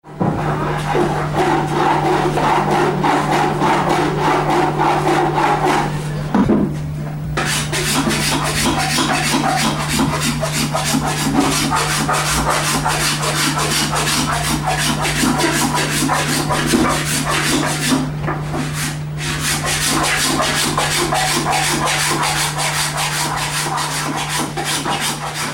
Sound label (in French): luthier; tunisie